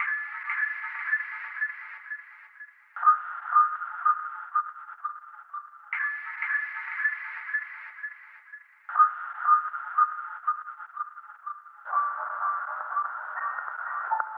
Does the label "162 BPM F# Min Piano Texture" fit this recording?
Yes